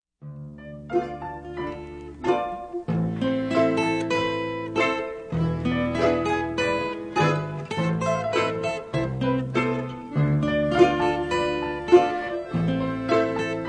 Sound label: two guitars